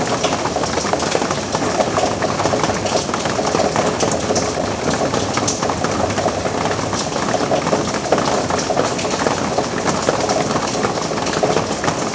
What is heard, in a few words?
Amb Rain Window